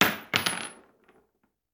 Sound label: Bullet Shell; Sound Ideas; Guns